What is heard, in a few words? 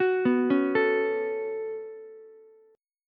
Db 7th Suspended 4th Sharp 5th Db down Ukulele; JGuitar